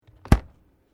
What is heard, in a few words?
Refridgerator Toshiba Door Close; Various kitchen appliances; Household